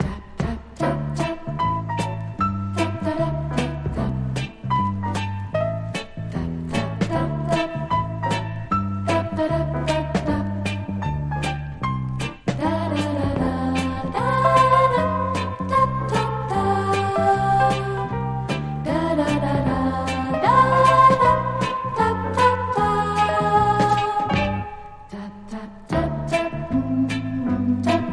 VOICES AND PIANO; CHORUS

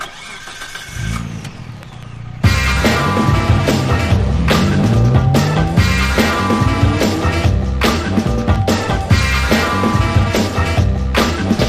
SING OUT KARAOKE

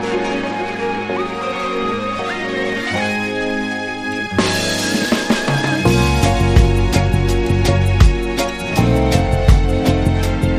INSTRUMENTAL

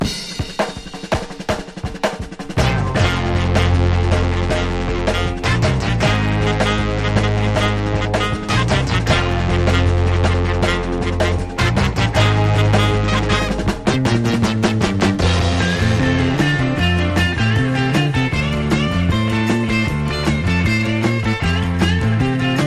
driving guitar

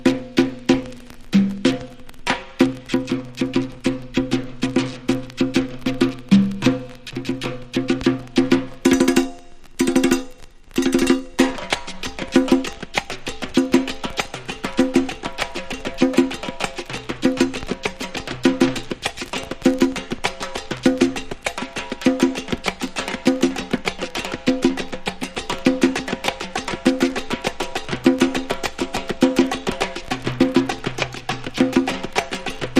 super latin percussion; LATIN JAZZ

